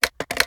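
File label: assault rifle reload fast mag in; gmod; viper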